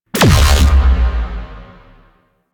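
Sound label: Grenade Launcher Projectile Explosion; Warframe; Weapons; ThanoTech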